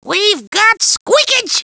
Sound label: Squeak Toy Pickup; Sweet! A Squeak Toy!; Gruntz